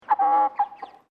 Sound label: Bird Chicken Koeyoshi Clucking Long, Chickens in northern Japan; Birds; Animals